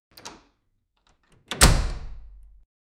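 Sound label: satisfying modern door opening; interior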